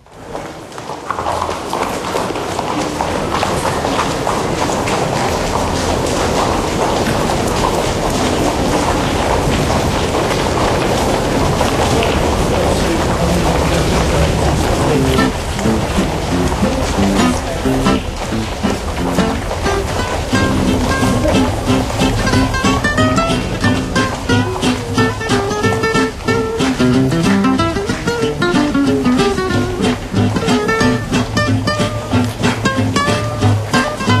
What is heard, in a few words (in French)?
Musique jazz manouche